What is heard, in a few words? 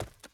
Sprint; SandBox Musics